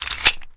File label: clip in, weapons; valve